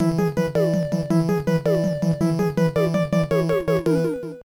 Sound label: Jingle; Rock On; TurboGrafx-16